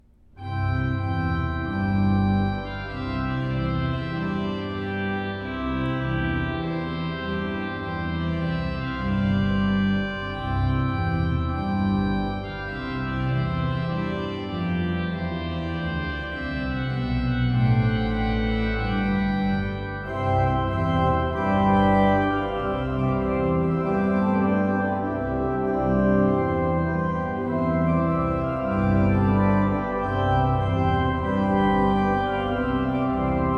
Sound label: Trumpet Tune; weddings; Purcell